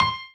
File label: piano melo